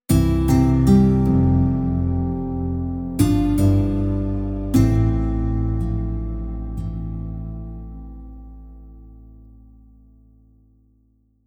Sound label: Caring Ident